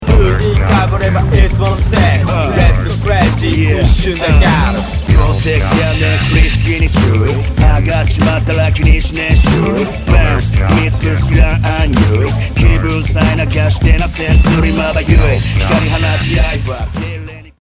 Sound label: masakari funk